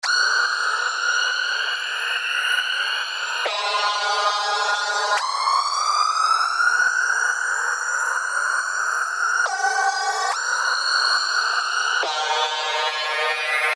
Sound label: Noise FX Loop 140 BPM; Pressure; revealed fx loops vol 2